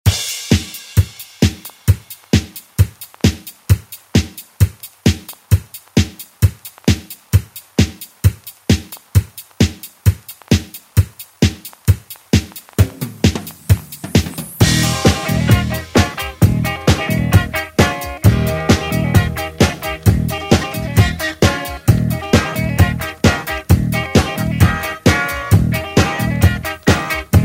80s ReDrum; DJ Mhark

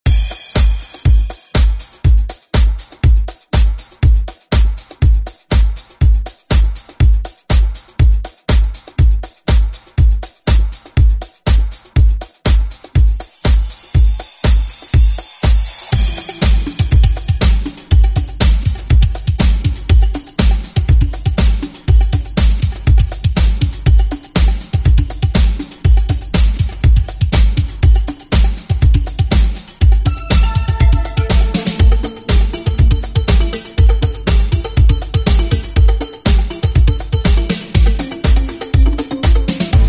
80s ReDrum; DJ Mhark

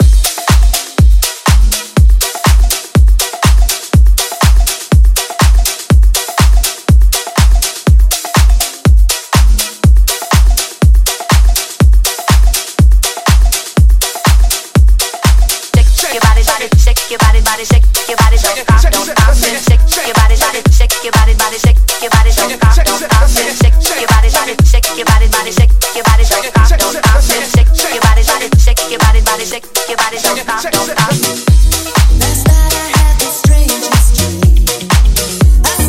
Original Mix Hype

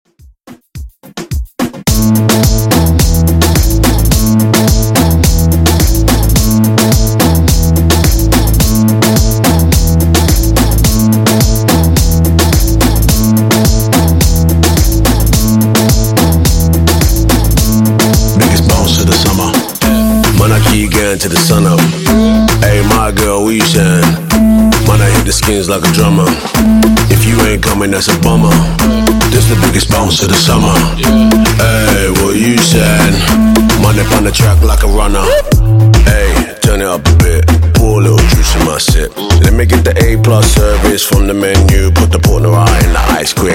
Intro Outro 107 Bpm

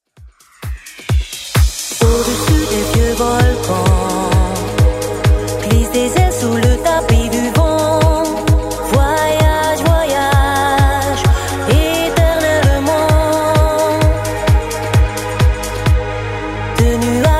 90s, 130bpm; Redrum

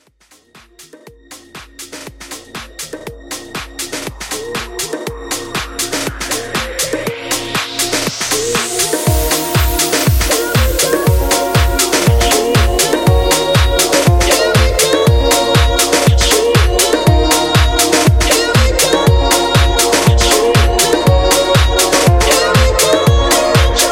Dance Club House 120 Bpm

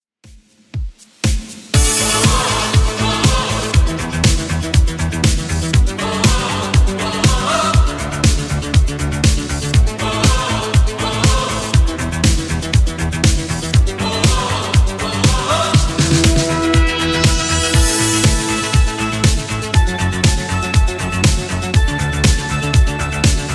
80s Redrum 120bpm

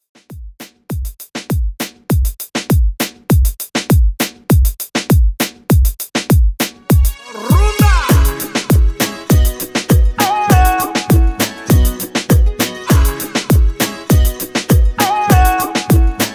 In Outro Reggaeton 100Bpm

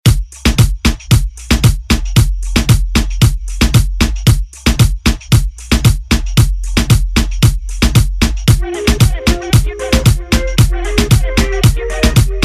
Reggaeton Redrum

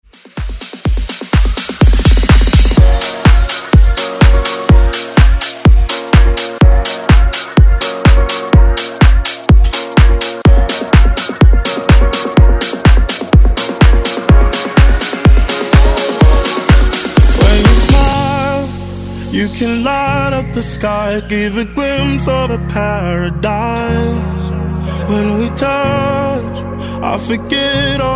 Dance Redrum

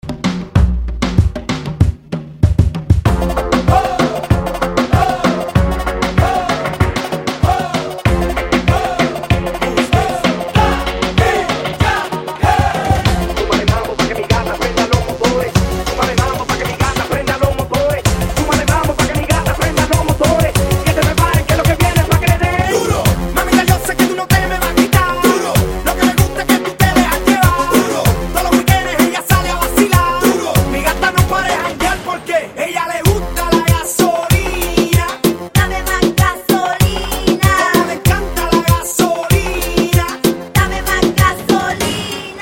Intro Outro 96 Bpm

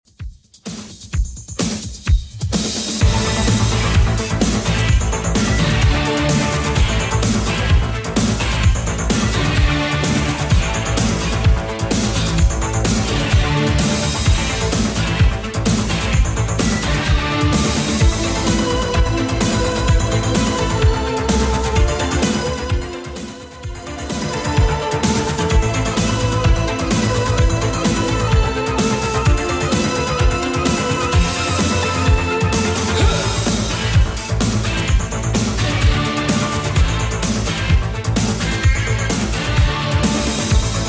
80s Redrum 128bpm

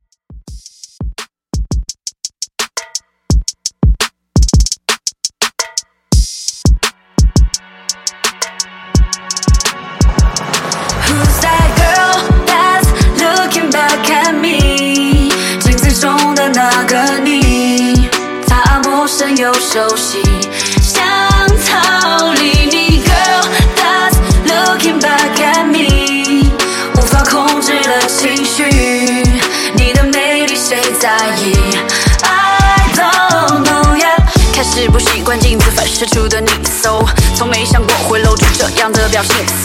85 Bpm

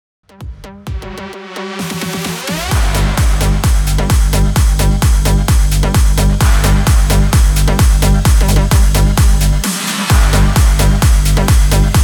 MASHUP 130 BPM

